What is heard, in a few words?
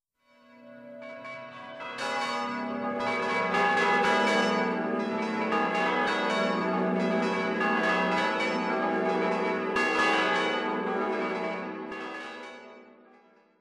Wedding Bells